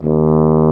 BRS F TUBA; Roland L-CDX-03

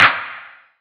Clap; M8; Electronisounds; Claps

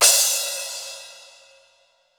Crash, Cymbals; Roland BK-5

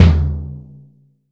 KICK, JAZZ KICK

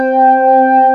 DIST HAM C4, organ; AKAI S-Series CD-ROM Sound Library VOL-2

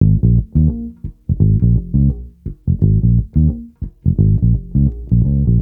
Bass Loop, Ambient Grooves

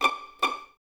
Violins Pizz, String Ensembles; Miroslav Vitous; 11 Violins

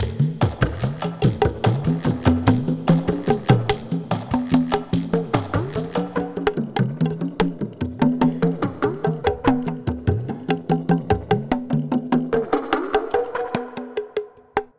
Jungle Vill Perc, Lounge Drumz

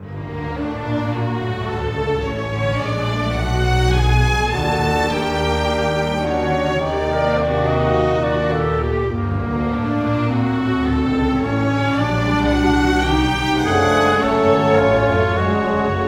Cinematic, Orchestral Series; Sonic Foundry (Sony Creative Software); Orchestral Series VOL-3 - Cinematic

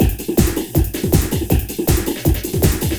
LOOP, Eurotech; Sounds Good

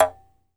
jaw harp, Pitched Percussn, Jaw Harps; E-MU Producer Series Vol. 7 – Old World Instruments

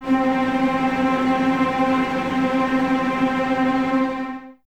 C 4, String Ensembles Cellos Tremolo; Miroslav Vitous

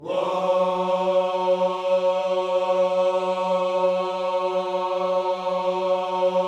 WHOA F#3, Gospel; Spectrasonics Vocal Planet

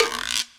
EQ Perc, Percussion Mix

